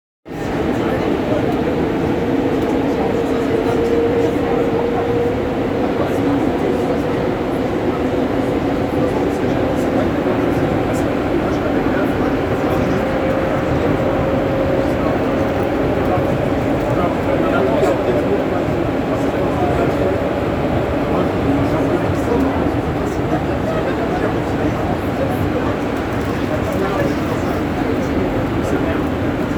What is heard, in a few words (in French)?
metro 14 pont cardinet